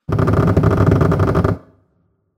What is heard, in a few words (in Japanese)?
扉を開ける 長め; ＲＰＧゲームのダンジョンやフィールド; ダンジョン・フィールドの効果音